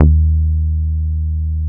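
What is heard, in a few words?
direct bass D#2, analog bass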